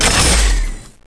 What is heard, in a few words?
cart clip out, weapons